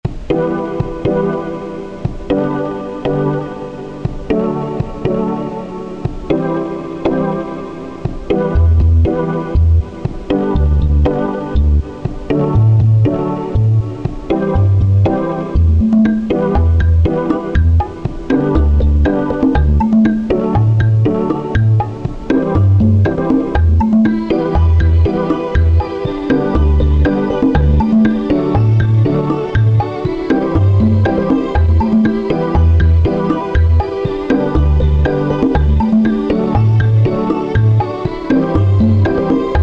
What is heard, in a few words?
elevator musak; weeklybeats 2024